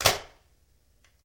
Minigame Generic; where angels cry tears of the fallen collector s edition

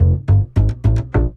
Bass Riff; the princess and the frog; Bayou; Music Riverboat

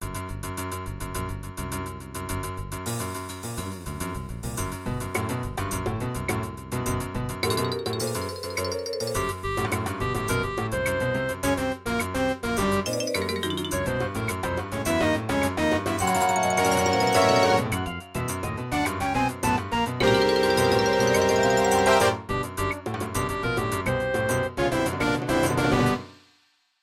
MIDI Ending

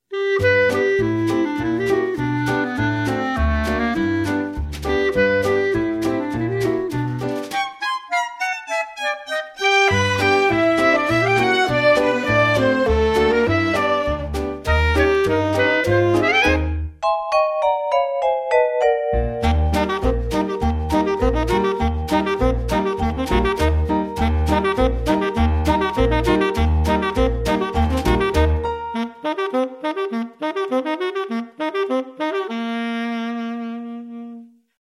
Instrumental; Nick Jr Radio

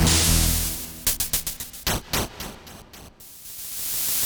music cellar intro; Skellboy

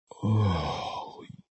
Orgasm

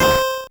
core squish C3

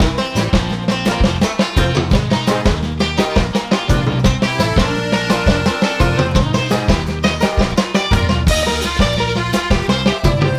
Tutorial Loop, Music; Tutorial Music Bank

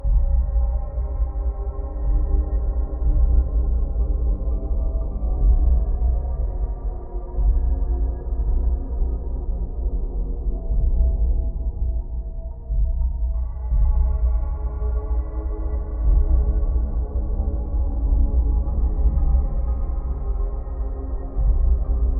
Chamber Traps Loop; assassin s creed chronicles india; First Civ

